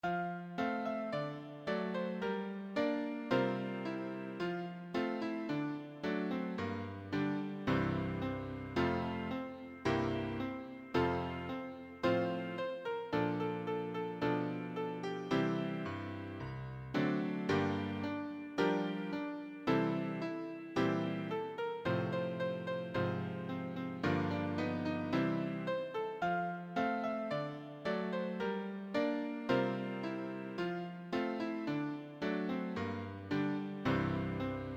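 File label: Look and Live piano accompaniment; church and sunday school music; Hymns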